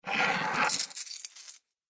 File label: Slide And Collect Coins From Table; Money Sound Effects